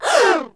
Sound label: roar